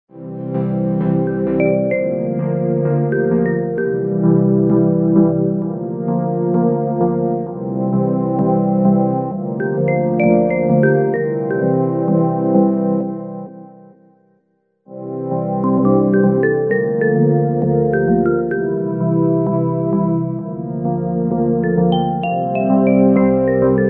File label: orch 8th; Orchestral 8th